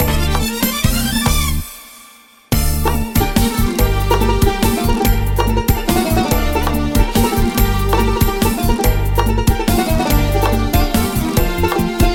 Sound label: Accordion